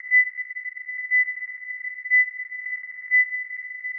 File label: narrow filter